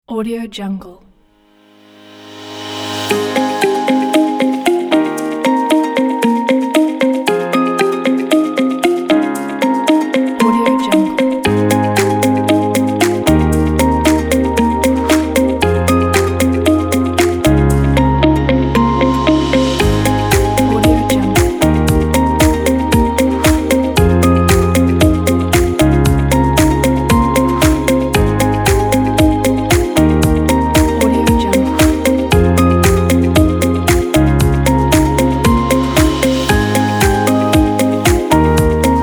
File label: corporate motivational uplifting